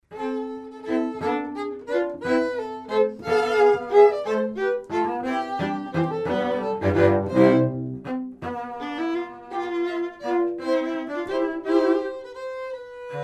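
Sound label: string trio; hopfe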